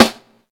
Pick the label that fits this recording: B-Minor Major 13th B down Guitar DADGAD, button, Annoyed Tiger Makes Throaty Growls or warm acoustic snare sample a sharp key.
warm acoustic snare sample a sharp key